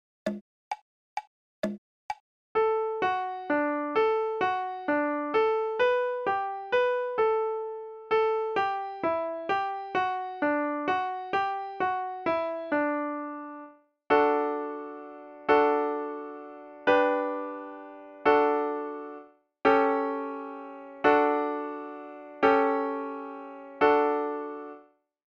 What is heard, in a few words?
Good Morning In D RH